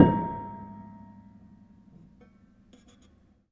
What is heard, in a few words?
Harm High, Piano Harmonics